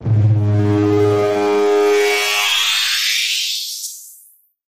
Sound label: Modulated Energy Sweep